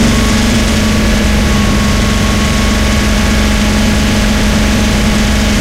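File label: panzer4 engine interior rpm load; War Thunder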